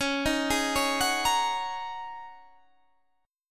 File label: Ab 9th Suspended 4th Db